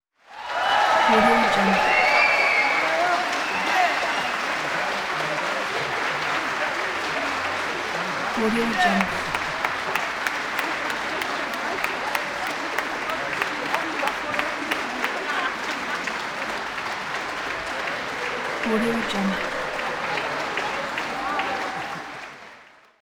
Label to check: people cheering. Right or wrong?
Right